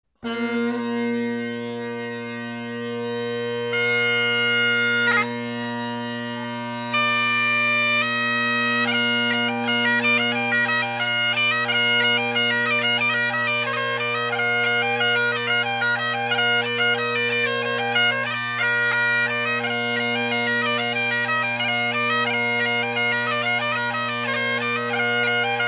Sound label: Sailors Hornpipe